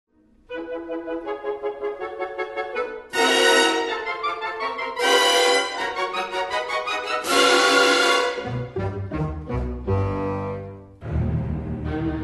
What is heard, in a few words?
picnic and beehive chase; winnie pooh